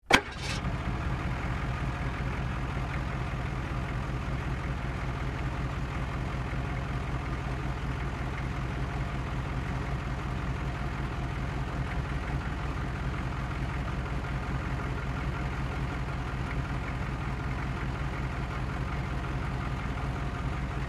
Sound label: Humvee: Start, Idle, Revs And Off.